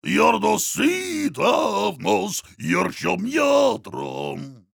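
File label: singing, German; Heavy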